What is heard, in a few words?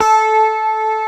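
ESP A5, chorus guitar; East West - Global Sounds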